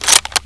Enfield bolt forward, weapons; dod

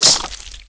li attack; svencoop; licker

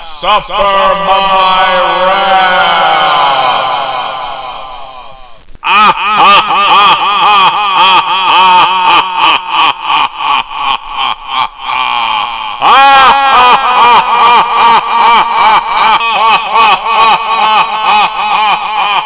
suffer; rx tomb

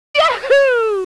join

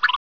cricket flinch; gearbox

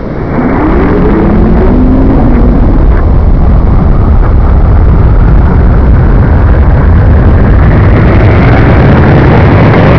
shotgun rift

